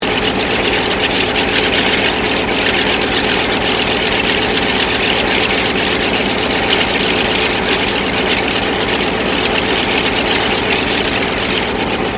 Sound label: AIRCRAFT CA-13 BOOMERANG IDLE; Airplane; Vehicles; Airplane Sound Effects